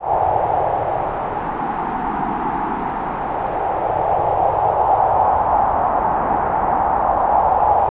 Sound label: Atmos FX, Atmosphere & FX; Loops; Touch Loops; BlissedOutBeats